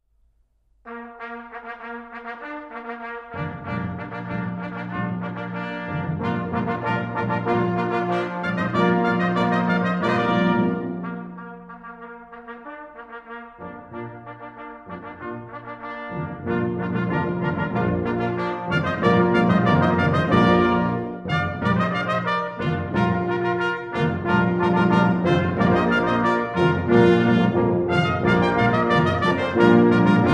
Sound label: fanfare